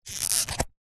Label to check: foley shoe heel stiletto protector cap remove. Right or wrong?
Right